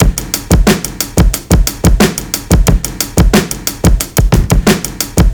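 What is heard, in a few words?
Sixteen Kit, electro jazz; Ударные и барабаны: Sixteen Kit A (Электро Джаз); 130 BPM; Drums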